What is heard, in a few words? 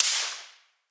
Valley Forest, Impulse Responses, Outdoor Spaces; Medium Spaces; Apple